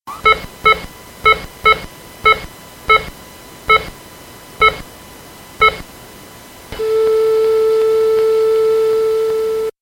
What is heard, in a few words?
death bleep